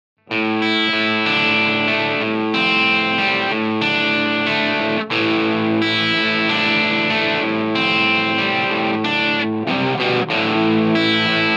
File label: Crunch; ACE Colt